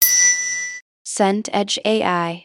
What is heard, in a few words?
bell small